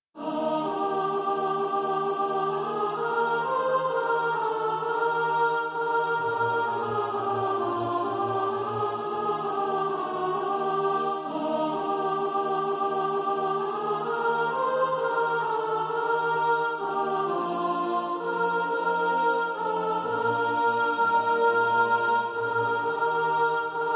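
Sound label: sop, practice music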